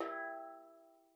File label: STEELDRUM E3; Xsample VOL-4